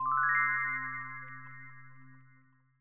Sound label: click item